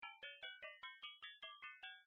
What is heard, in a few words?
ping